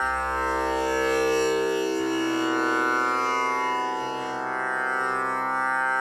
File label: tanpura c3 60 bpm